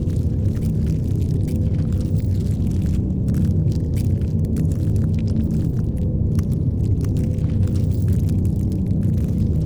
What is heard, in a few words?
ship titan idle; Stellaris; biogenesis